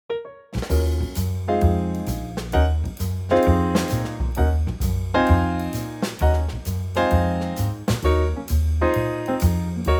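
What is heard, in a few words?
Ebm Trio, Backing Tracks for Male Vocals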